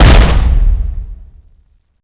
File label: step; glasgavelen